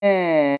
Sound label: ehh, mummy groaning